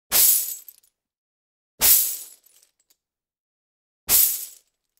coin bag; کیسه سکه

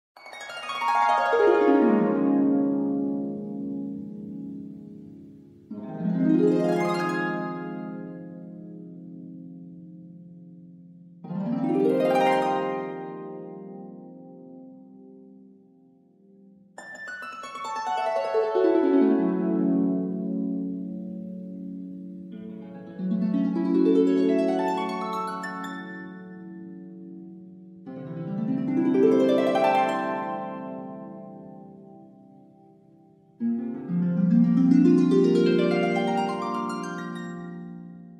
harp

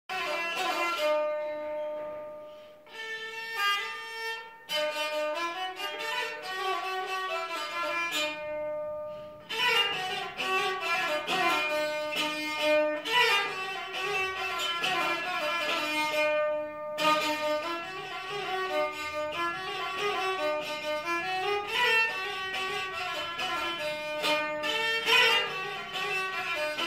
ghaychak; قیچک

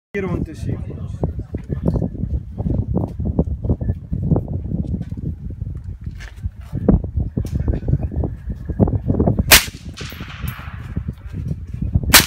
Norinco CQ, اسلحه; نورینکو سی کیو

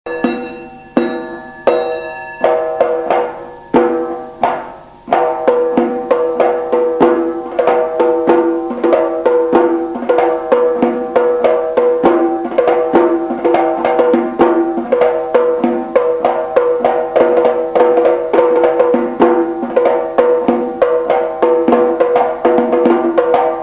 Zarb Zoorkhane

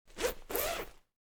jacket zipper

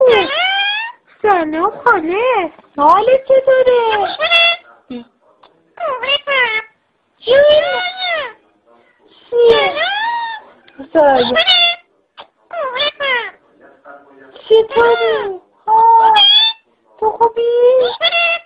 say to hi parrot; عروس هلندی سخنگو سلام

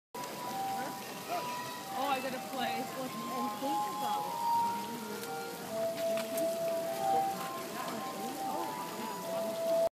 glass harmonica

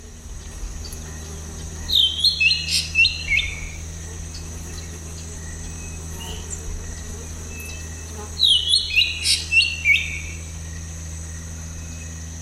Velvet mantled drongo; پرنده بوجانگای مخمل پوش

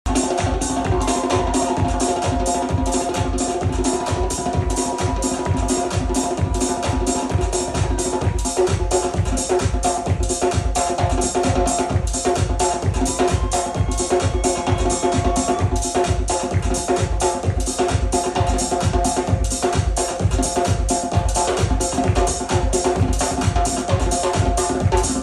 Djembe

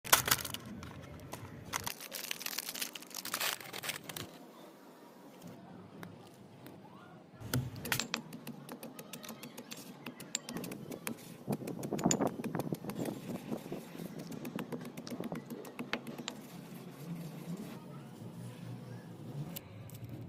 biscuit asmr, خرد شدن بیسکویت

